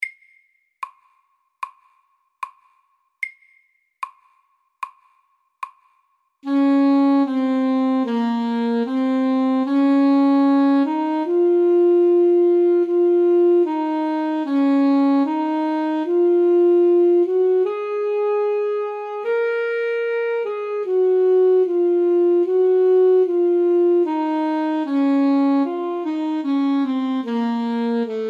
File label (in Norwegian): Etyde SAKTE